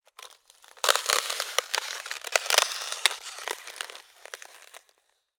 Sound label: nature ice crack